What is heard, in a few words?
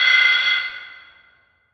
Open Hat